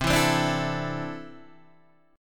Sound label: C 6th Add 9th C down Guitar DADGAD